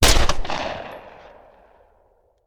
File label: Hand Gun; Sound Ideas; Guns